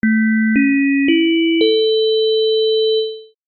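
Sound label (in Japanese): お知らせ・始まり・遅め; 戏剧音效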